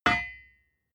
hammer hit steel

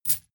step armor chainmail

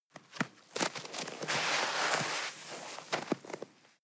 snow grab soft

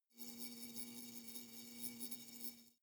hornet hum glass constant soft

